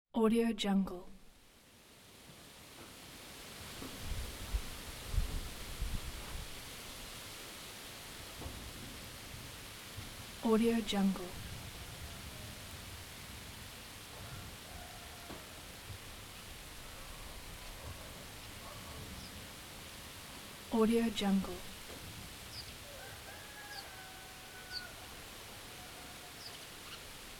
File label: rustic rain